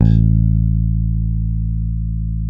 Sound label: bass, sustain; Sampleheads; Will Lee Bass Library